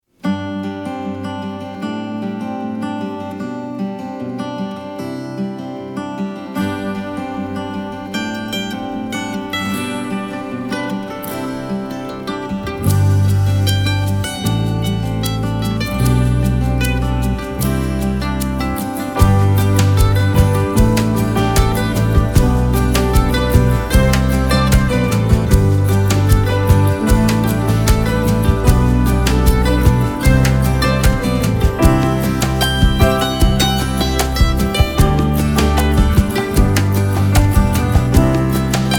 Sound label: Soft And Sweet; ycrpg; bgm; 温柔又甜蜜没有器官